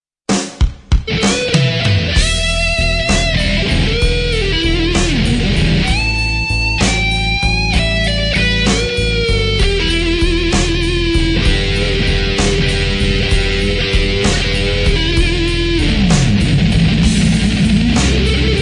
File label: Blues; Blues Engine Demo